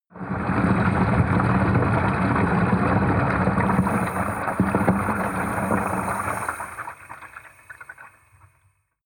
bathtub underwater fill hard

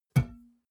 glass door small hit damped

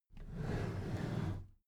packed drawer smooth clatter open soft halfway

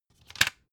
cd cover open hard

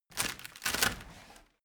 rotten wood break

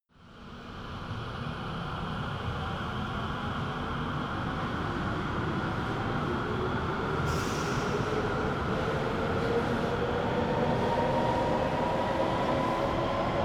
train depart big train station

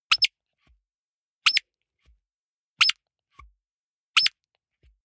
squeaker squeeze hard

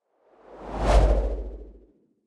whoosh impact soft wobble bass

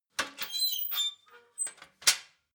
ladder metal handling fold

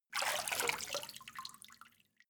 step water walk out

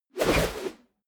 whoosh air

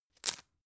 tissue open hard

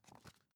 magazine page handle

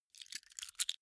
key ring large grab soft

